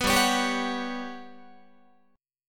G Major Sharp 11th G down Guitar 4A 4E 5A 5D 5G sharp 6C sharp; Listen to GM#11 strummed; GM#11 Chord